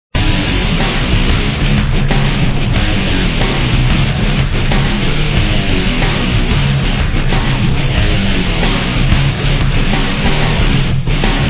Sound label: Alien Matrix Loop; SpongeBob SquarePants Reef Rumble